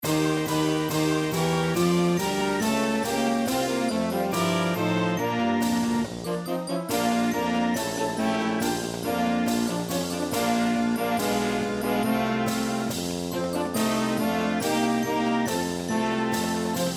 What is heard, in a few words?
Fight Song, Marching Band